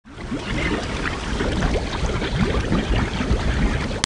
water bubbles, This is the sound of water bubbling.